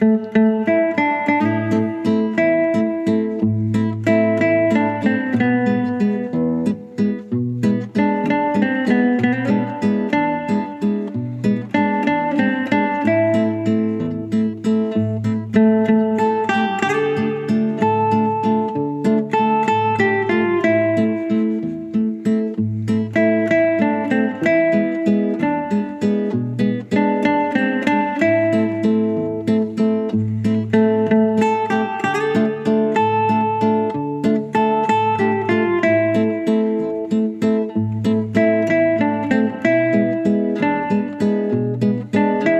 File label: waltz